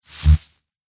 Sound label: Electric Discharge, Magnetic, Science Fiction; BlastWave